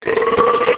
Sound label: buurp; human